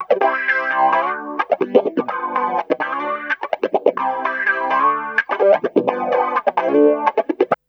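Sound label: guitar, Roots Disco; USB Soundscan vol.32; AKAI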